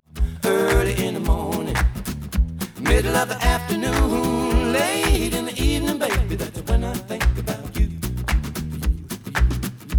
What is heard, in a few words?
noised; 4bit